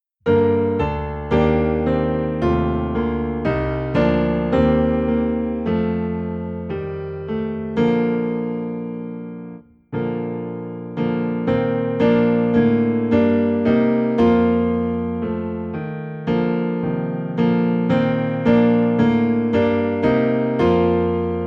Piano Low in B Flat

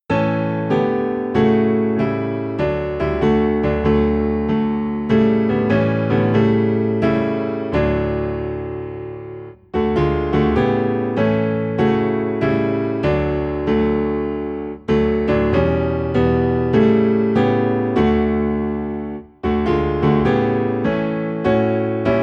Piano Low in D; In My Heart There Rings a Melody

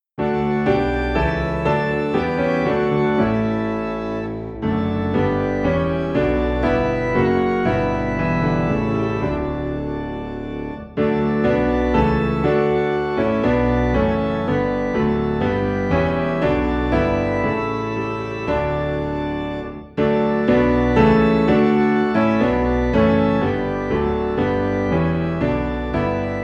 Piano and Organ Low in D

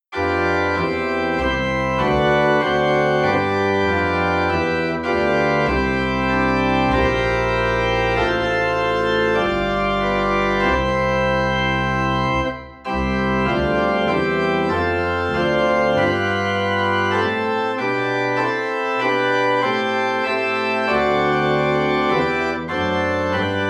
Organ in C; May Jesus Christ Be Praised

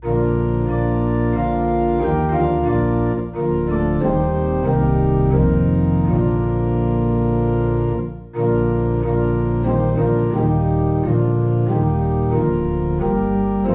Organ Low in B Flat; Be Thou My Vision

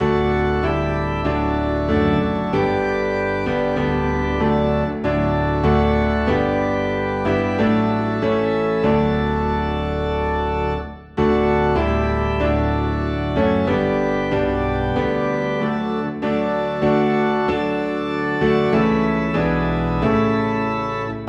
Piano and Organ Low in D; Tis So Sweet to Trust in Jesus

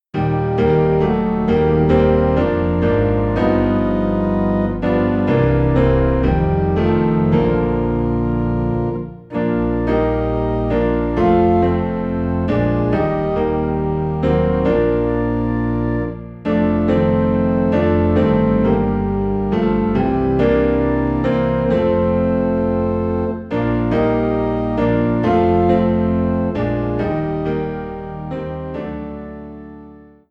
Piano and Organ Low in B Flat; Living for Jesus

